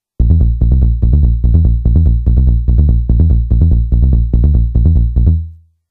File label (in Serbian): Basslinija; Fruity Loops Studio v10